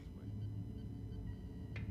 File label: Comp sounds; Trek